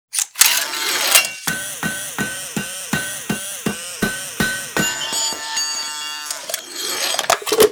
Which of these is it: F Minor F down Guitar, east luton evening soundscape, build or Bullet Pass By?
build